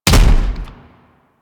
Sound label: Dex Sybaris Shot B; Warframe; Weapons; Tenno